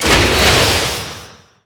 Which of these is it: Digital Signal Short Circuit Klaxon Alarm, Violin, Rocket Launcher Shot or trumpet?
Rocket Launcher Shot